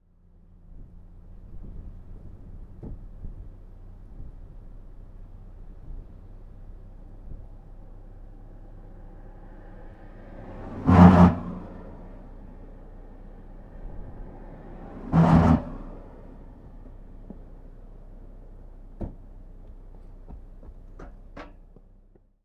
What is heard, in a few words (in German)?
vor myvatn; Ristarhlid